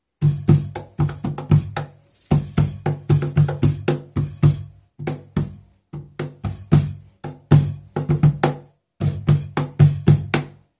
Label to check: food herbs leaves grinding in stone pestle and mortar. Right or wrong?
Wrong